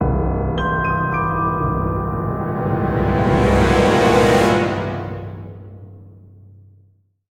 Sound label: stinger game over; roguebook